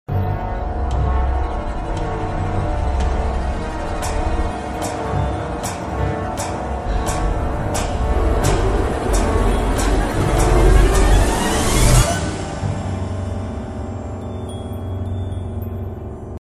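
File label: background music Telugu Ringtone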